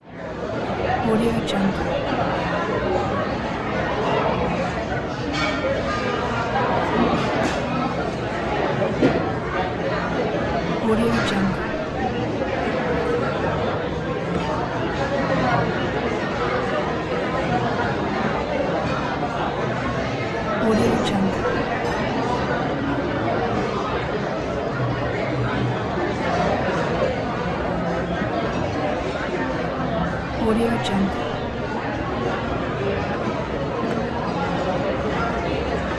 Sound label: restaurant ambience; eternal future productions